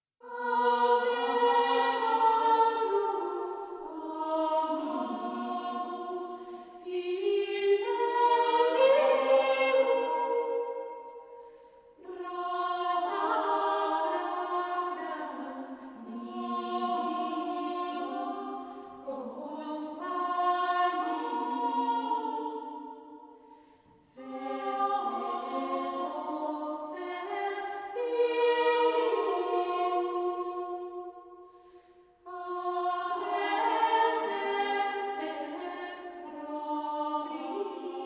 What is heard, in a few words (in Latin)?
Gaude chorus omnium Angelus motete